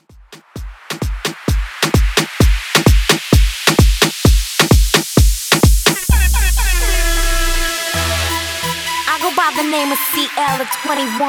Tribal; remixplanet

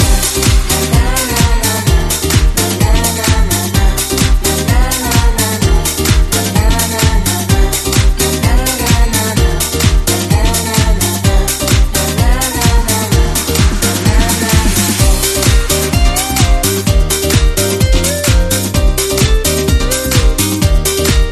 128bpm Gm

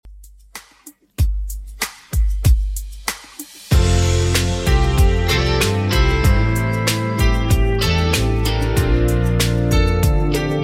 90s 95bpm; Redrum

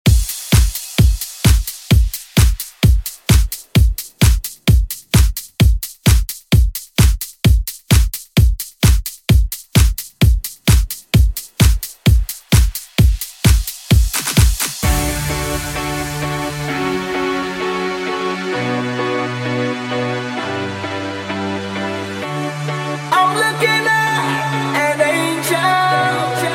Pop Redrum snippets; Deejay Hit

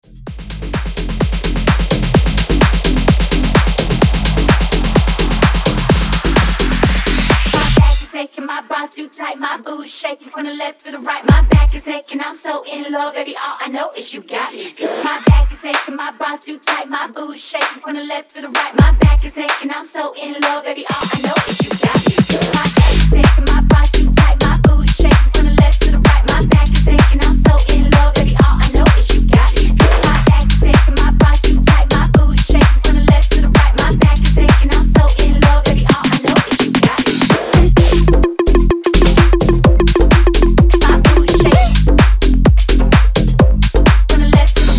tech house breaker 128 bpm

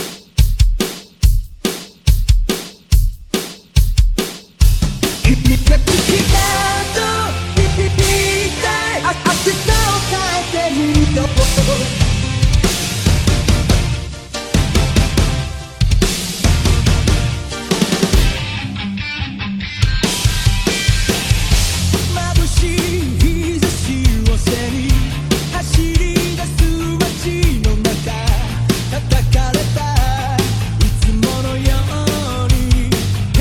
Mixshow Intro

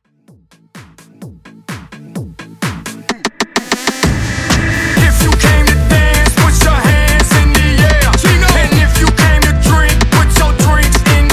Tech House Bootleg; Top40

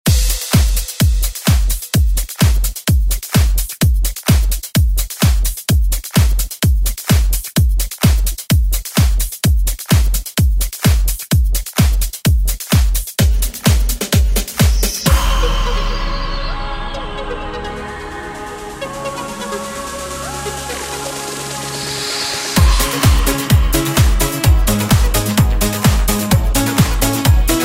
Re Drum; DJ Mhark

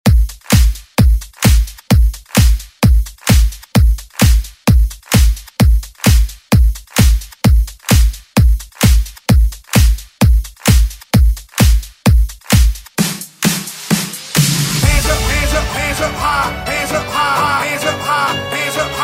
Hit Party Hype Redrum; Deejay Hit

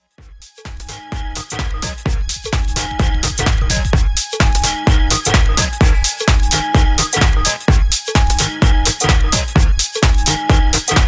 Classic Future House 128 Bpm

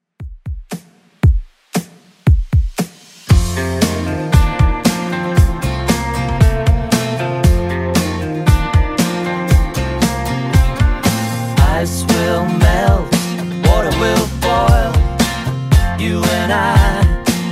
80s Redrum 116bpm

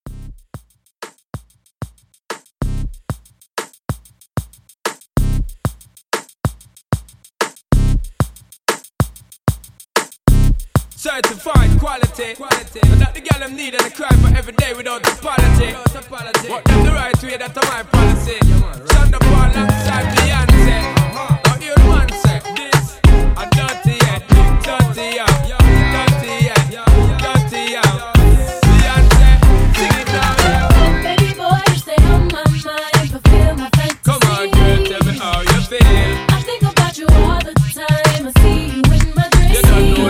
Dancehall Re Drum 94

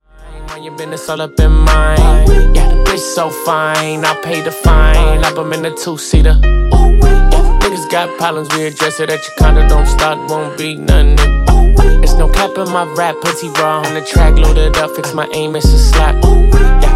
Funk Intro Outro 101Bpm

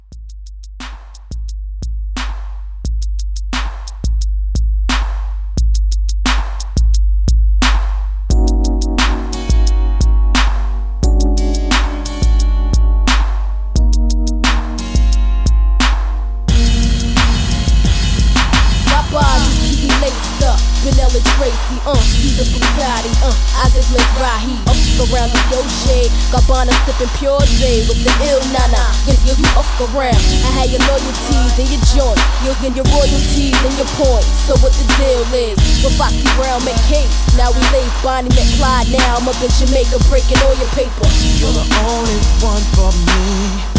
90s Hiphop Re-Drum 88

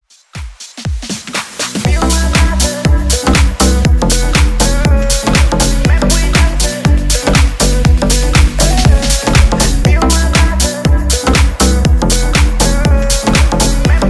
Dance Redrum

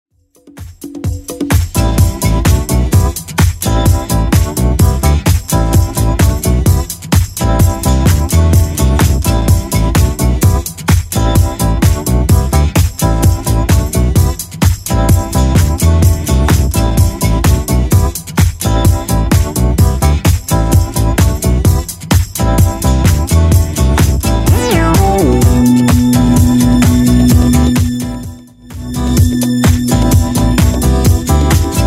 70s Redrum 128 bpm

